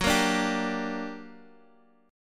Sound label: G Minor Major 7th F sharp